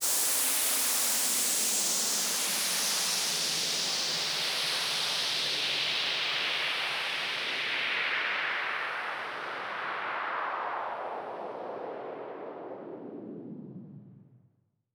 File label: Sweep Down FX 138BPM; Sound FX; Dminor